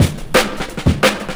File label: 175, Breaks; Drum&Bass; EZ Rollers